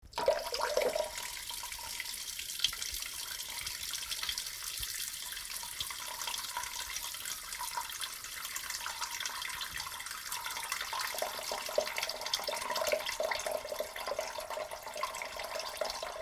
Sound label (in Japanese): ぼちょばしゃしゃおしっこ